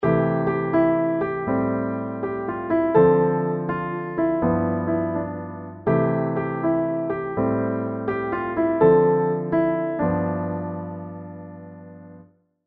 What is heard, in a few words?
Piano G